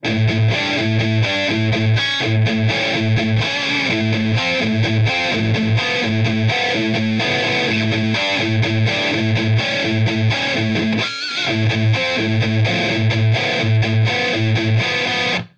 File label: ubermetal